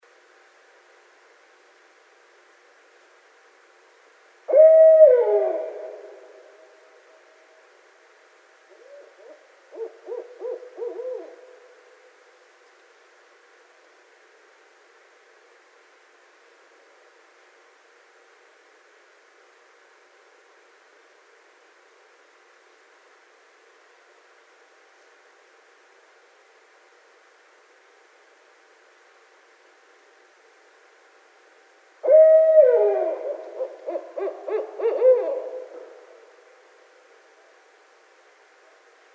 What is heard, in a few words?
Barred Owl